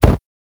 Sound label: fire start; Rust